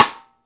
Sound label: STICK, Drum 1 Dry; East Collexion